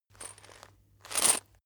chain mail movement

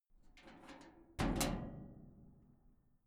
metal gate latch hit

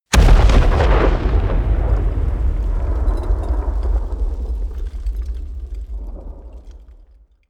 detonation

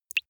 single drops falling

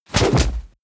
kick and drop

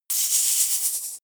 snake hiss

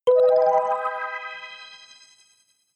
notification kalimba magical octave high

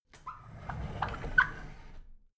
drawer big squeak open soft

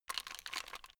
plastic rattle fast short

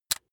razor rickety fold out

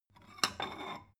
stone bowl take soft

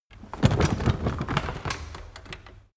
cart medieval drag start stop

